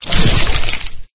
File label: carrier walk; flood